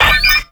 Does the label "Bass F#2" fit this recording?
No